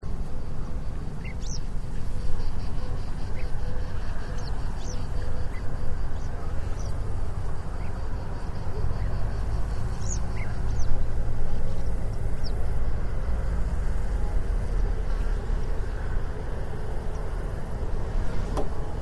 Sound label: motflafel flightcall; Keltavästäräkki (alalajit feldegg ja flava; lentoääniä samasta parvesta); Huomaa feldeggin karhea loppuosa; Alalajin flava lentoääni ei sirahda; Jarmo Pirhonen